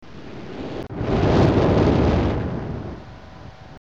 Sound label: Ambience; Myst